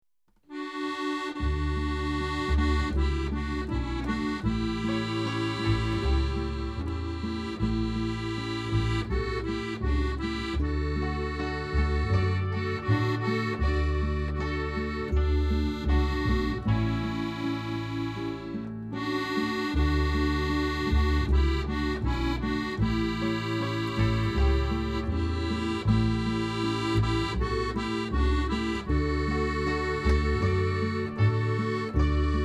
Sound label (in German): Montafoner Weihnachtslied Hausmusik